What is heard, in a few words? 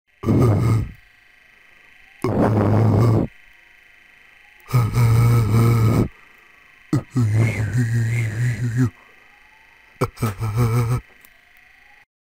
Primitive Human; انسان های اولیه